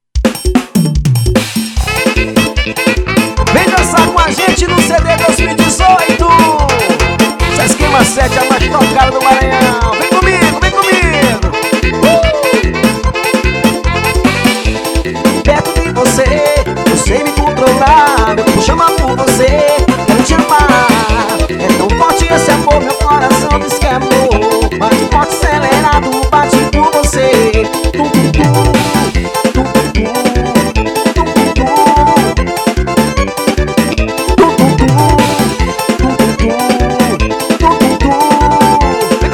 tocando todos os ritmos

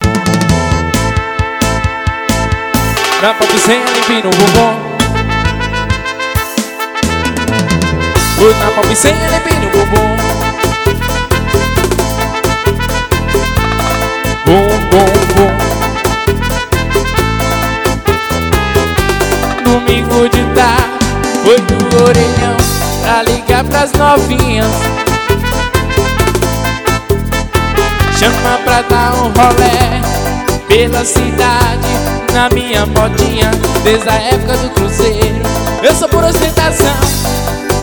arrocha; pop

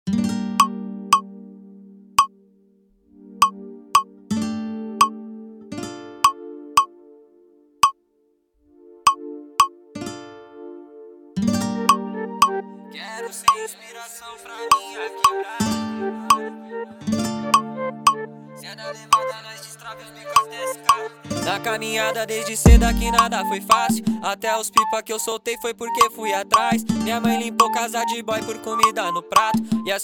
funk executivo

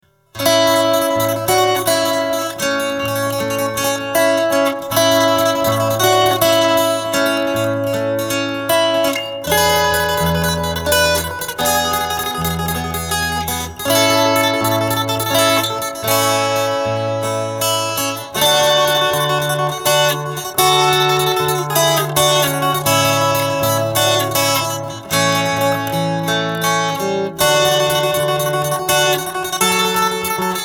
violao

